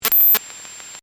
Scifi, Communication, Scramble, Glitch, Distortion; Science, Fiction; Communication Sound Effects